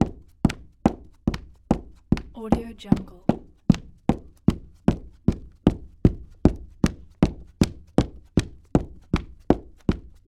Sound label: a footsteps